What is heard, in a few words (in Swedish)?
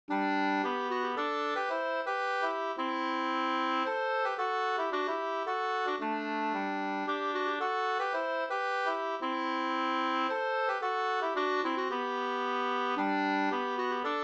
polska